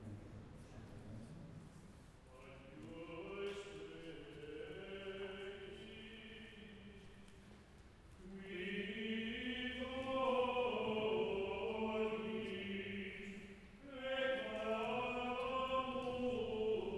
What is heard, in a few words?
wedding music, chant